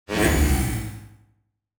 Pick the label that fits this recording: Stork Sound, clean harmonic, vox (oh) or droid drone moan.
droid drone moan